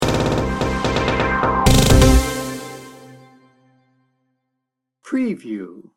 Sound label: club call sting bumper